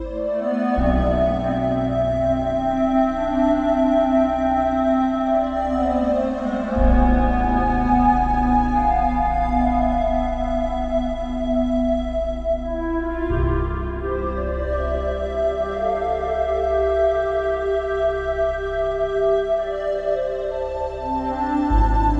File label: boss intro intensity loop 172bpm; chicory a colorful tale; ps4